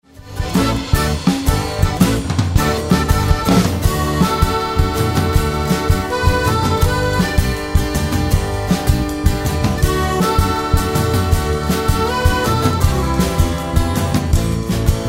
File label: instrumental